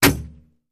Bullet Impacts Metal

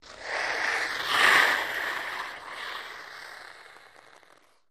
Ice Skating Swerves

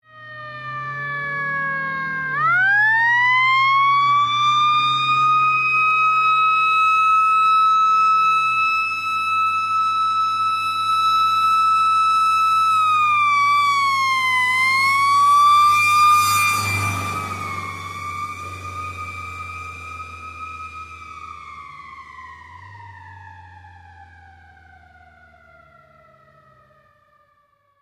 Fire Truck By Medium

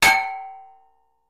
Metal Hit Ring, small impacts

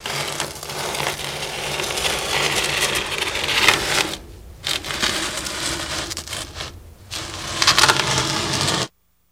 Bike Tire Drag Rub

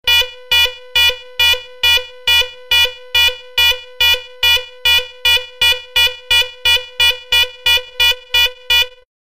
Alarm Warning Synth; hi-tech various

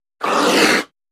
Puma Cougar Hiss Shor, big cats

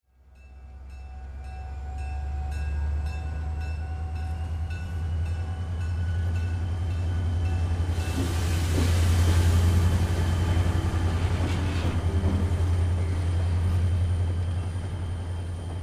Train Station Amb Die

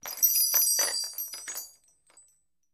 Bells Shop Jingles

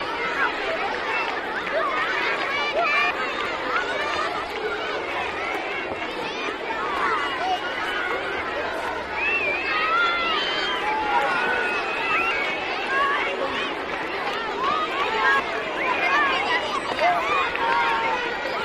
Crowd Spanish Kids, kids play

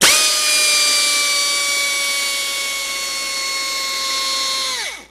Saw Air Tool Epiderm, saws

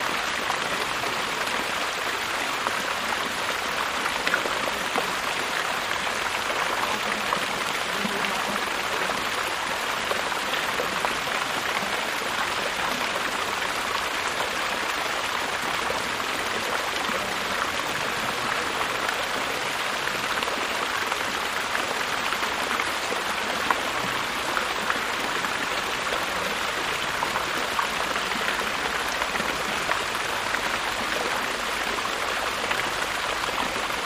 Streams Water Pool, fountains

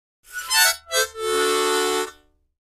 Mouth Organ Shrt, harmonica; woodwinds

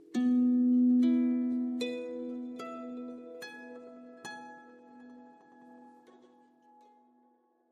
Harp Arpeggio Reverb; strings harp

